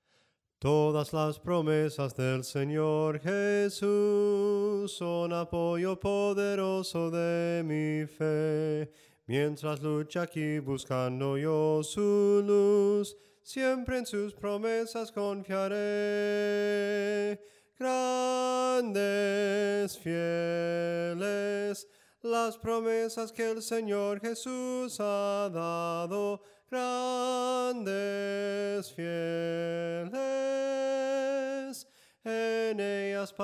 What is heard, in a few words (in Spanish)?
SOPRANO CANTADO, himnos en melodia soprano